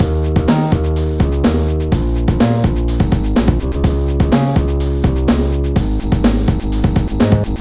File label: super beat